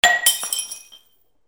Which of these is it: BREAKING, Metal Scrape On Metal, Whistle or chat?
BREAKING